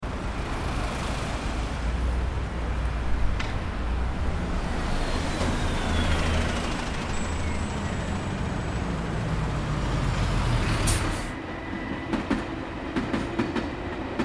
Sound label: London City Sounds; MDM Sounds